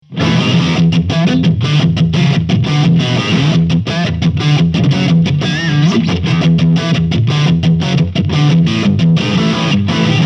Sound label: RAW DAWG 60 EG Heavy Crunch